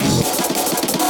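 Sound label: drum beat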